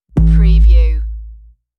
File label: Bass Doing